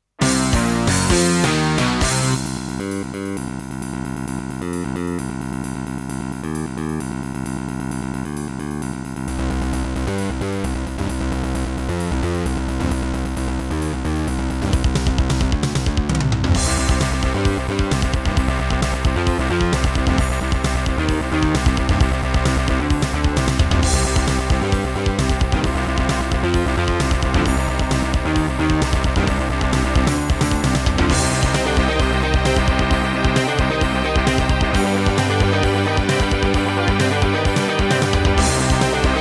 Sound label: Roland CM-300